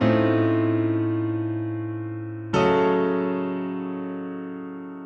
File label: Piano 95-A, horrorscape samples 95bpm